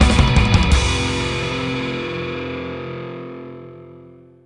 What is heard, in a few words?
Mission Failed! Jingle; the simpsons hit run; ps2; Street Racer Anthem - Jingle 2